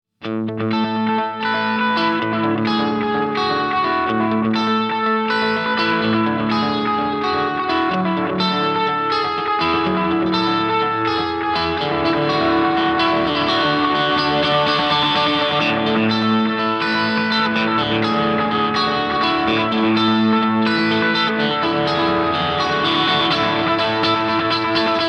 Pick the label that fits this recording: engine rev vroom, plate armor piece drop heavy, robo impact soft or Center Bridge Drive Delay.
Center Bridge Drive Delay